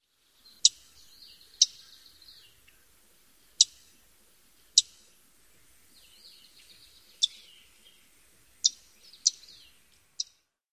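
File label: calls, Red-faced Warbler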